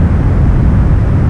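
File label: water pipes; ambience; XDM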